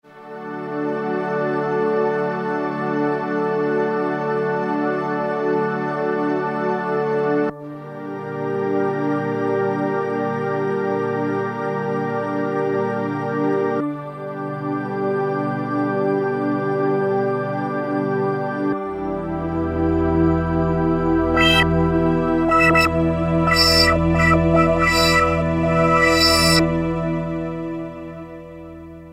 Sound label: Big Pad; Roland M-DC1